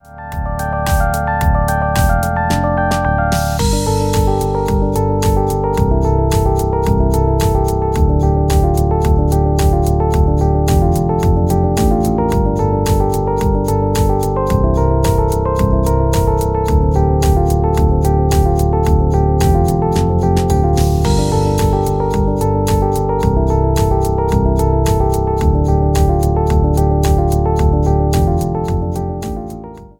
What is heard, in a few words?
Dynamic flash rhythm, ringtone; MIUI12